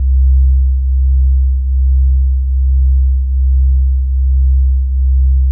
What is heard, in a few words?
C2, synth basses; Music Store Sound Service; Einstein